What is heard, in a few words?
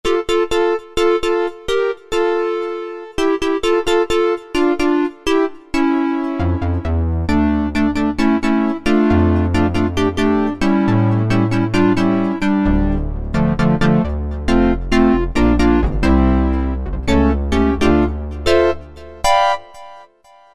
Piano; Cheetah MS6